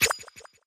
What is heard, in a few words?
alert